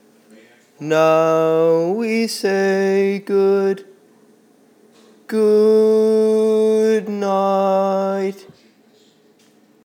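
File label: Baritone, Barbershop Tags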